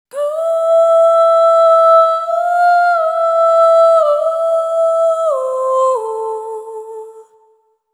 Single Voice Melodic Loops, Vocal Samples; Pocket Diva World Class Vocal Samples

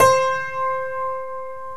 TACK PIANO

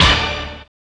old skool stab

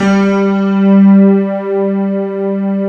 piano pad G3

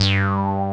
303 ACID BS; Pro-Rec - Synthsonic; BASSES

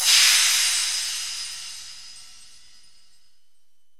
STACKED PERC

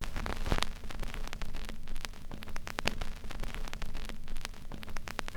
Crackle, Vinyl Noise

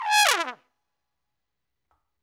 TRUMPE, Trumpet FX; Roland - LA Composer vol. 2

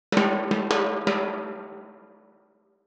Timbali Tank, Latin Percussion; Sonic Foundry (Sony Creative Software); Processed

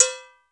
METALIC DRM COW; 90 sSampleCDs; Crypton - DrumBase5000

